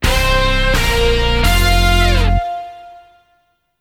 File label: dingdongdang